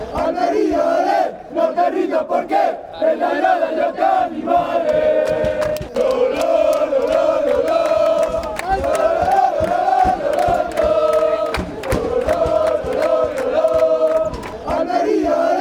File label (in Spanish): yo te animare, fan chants; almeria